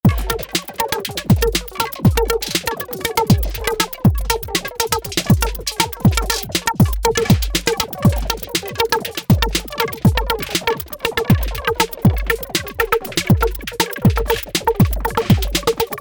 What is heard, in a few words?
drums grainbrain; grainbrain by xnullx